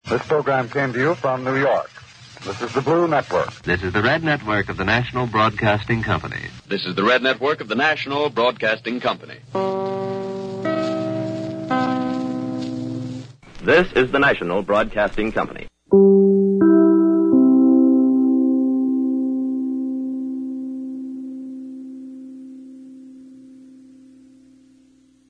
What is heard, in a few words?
CHIMES; NBC IDs